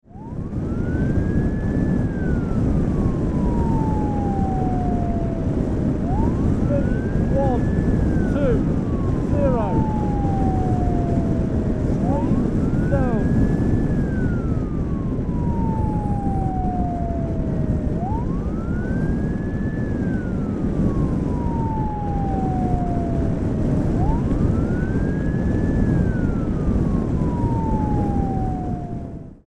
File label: Motorcycle extract